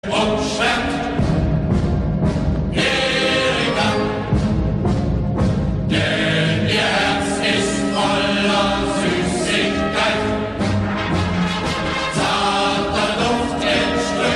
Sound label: German Soldiers Song